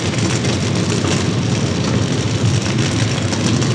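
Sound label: ambient fire loop; Portal 2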